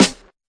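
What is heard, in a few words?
snare, Classic Hip Hop; STYLE KITS; Classic Hip Hop Mega Kit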